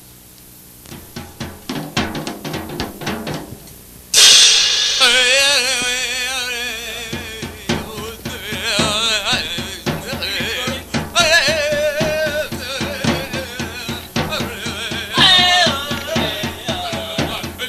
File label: Indian Music